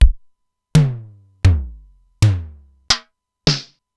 Simmons SDS-9 Factory C1

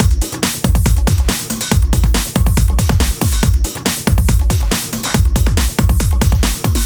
Break Beats, 140 BPM

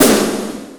Distorted Snare; Yamaha PSR E305

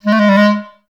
G#2, orchestra; Best Service; Peter Siedlaczek's Advanced Orchestra

